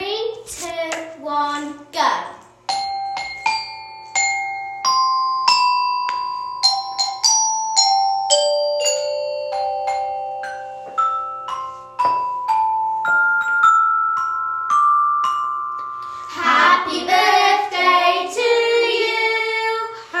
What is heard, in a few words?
Happy Birthday Quartet; Waterhouses